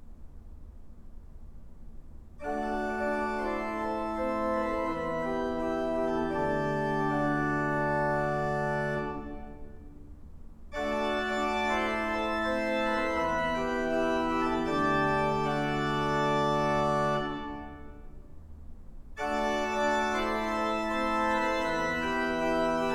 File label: great chorus, organs